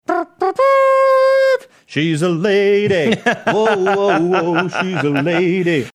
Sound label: making mouth trumpet sounds